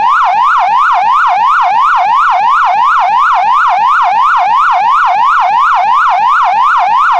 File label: yelp, sirens; federal sig ms4000